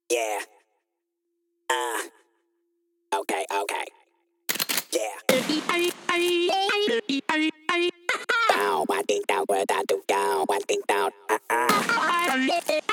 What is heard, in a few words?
Voices